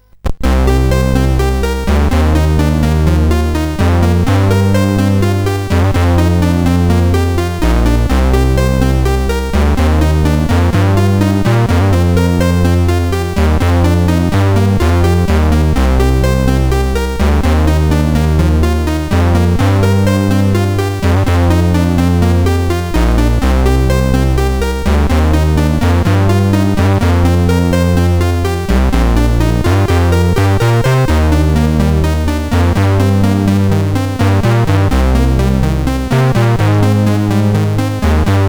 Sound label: Bass, CSG8580R5; Etwas; Snabel